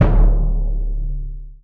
Bd Punchy; Torchlight III; PS4

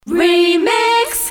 Remix Stinger

